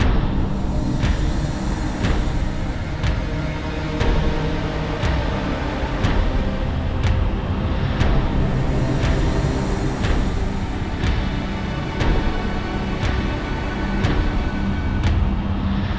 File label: Danger Tonal; Insanely Twisted Shadow Planet